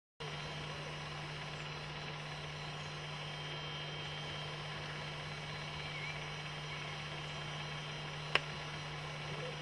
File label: tyne valley juv flight call